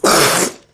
Spit; Lewd SFX

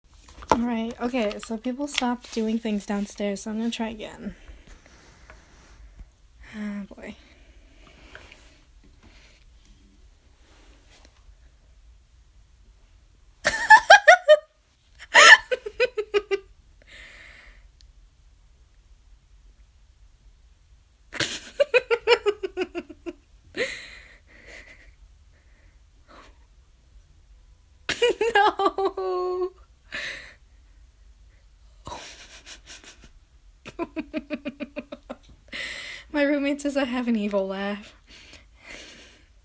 yay laughing

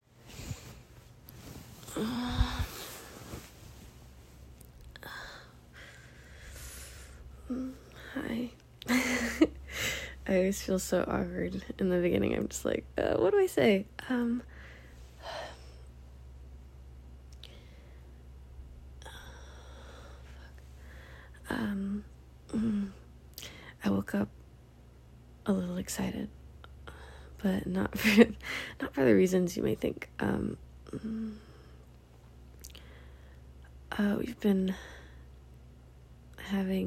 Rainy day, Giggles; F4A; Rainy day edging session part 1

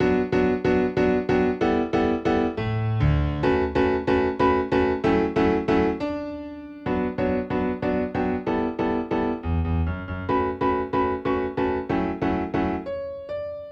Build Piano, 140BPM

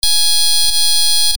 snares; snares per millisecond

